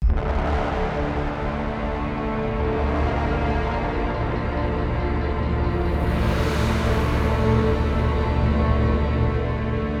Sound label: String